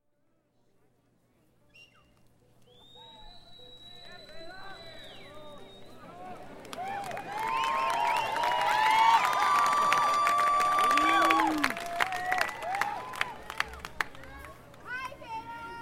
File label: crowd